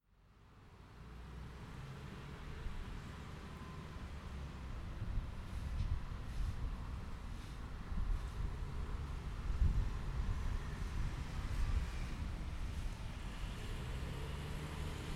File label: traffic noise; uvic